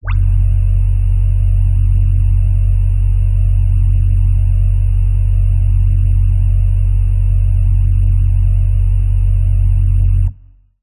science fiction electricity burst short hum slight high frequency buzz